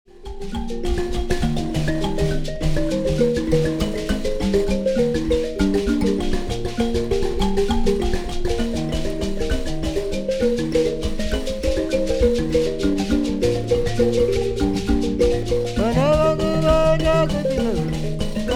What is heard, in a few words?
mbira